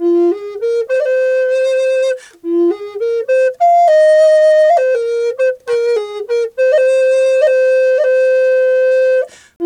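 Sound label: flute, 100 BPM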